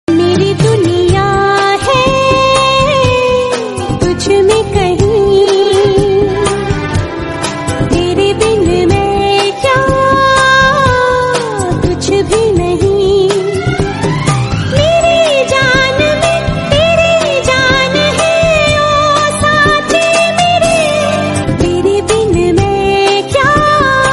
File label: female version ringtone